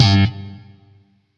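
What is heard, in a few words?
Shawzin slap G, octave two; Warframe; Sentient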